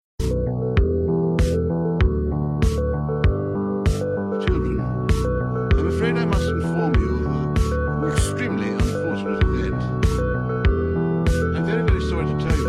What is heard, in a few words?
instrumental slowed